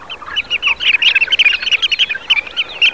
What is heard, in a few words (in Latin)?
Tringa stagnatilis; pticy; kulik